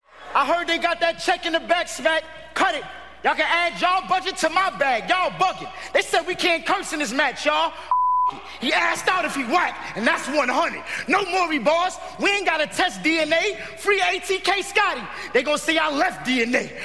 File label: rap verse